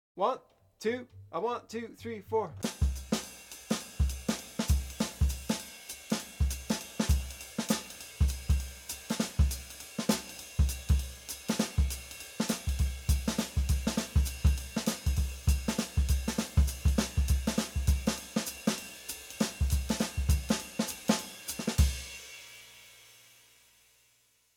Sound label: Comp Ex. fast, Bop Drumming; The Art Of Bop Drumming; Riley